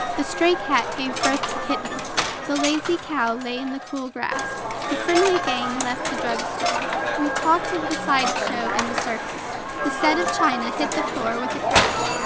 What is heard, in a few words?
casino